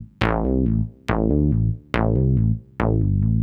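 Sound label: SYNTH BASS DANCE 140 A; eJay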